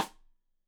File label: Side Stick Stereo; Deeply Sampled Drums; SMDrum